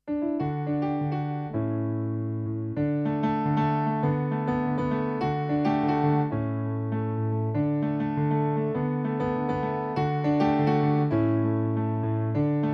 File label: Piano